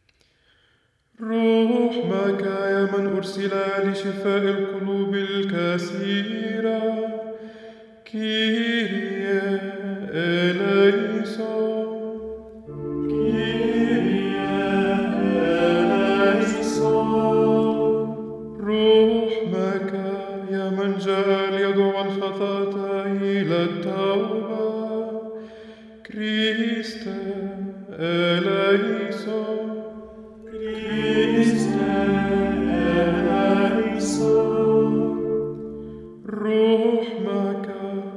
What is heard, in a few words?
Penitential rite Miserere; فعل التوبة لحن 3